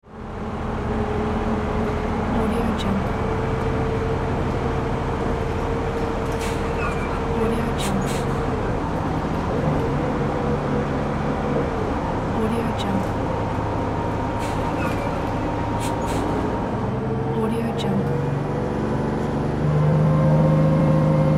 in bus; tr-sound

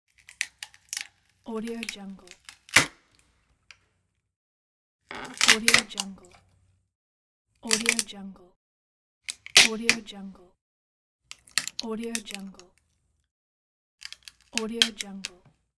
wood breaking splintering

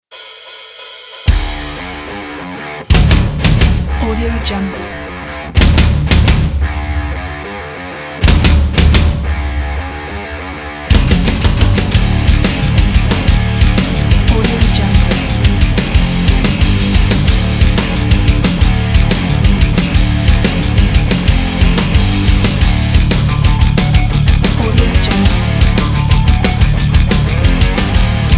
power rock

